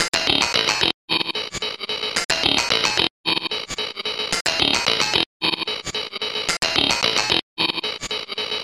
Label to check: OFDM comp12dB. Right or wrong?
Wrong